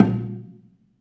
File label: bela A#1, belafon; USB Soundscan vol.60; AKAI